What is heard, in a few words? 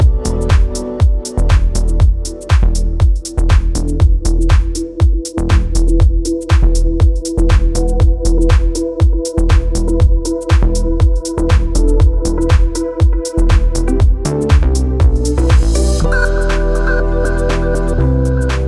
full mix 120bpm em